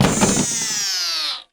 item robot arm drop

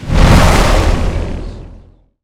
dash; ASTRO DUCHESS TITAN

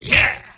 soldier positive vocalization; tf2; mvm

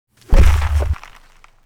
step; brainrot; leru leru le penguinel

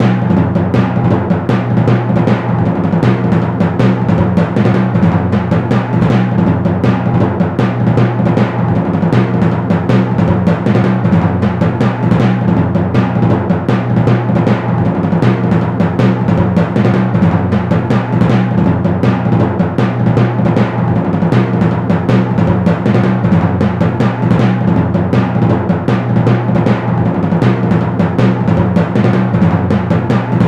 bonus round, music; Garry's Mod; tf2

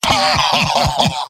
laugh short; heavy; mvm

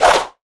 pickaxe swing; tf2

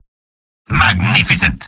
spy positive vocalization; tf2; mvm

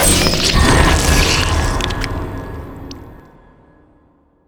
powerup pickup plague infected; Garry's Mod; tf2

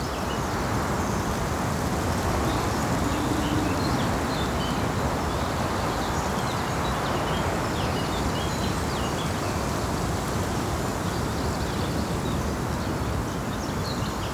clearing, ambient; Garry's Mod; tf2; rottenburg

